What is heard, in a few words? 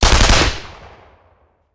Machine Gun; Sound Ideas; Guns